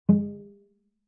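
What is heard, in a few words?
Cello pizz fortissimo sul C G3; MIS Pitches - 2014; Strings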